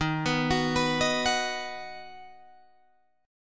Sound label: D sharp Minor Sharp 5th D sharp down Guitar Open G